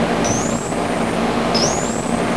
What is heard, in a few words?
flyback; flyback circuitry charging the capacitor before the first spark